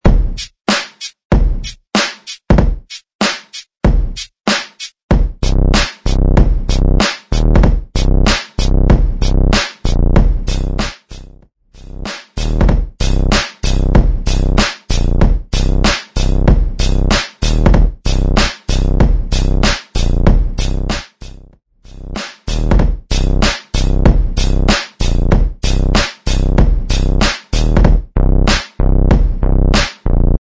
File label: 95 BPM Tough Guy Urban Electronic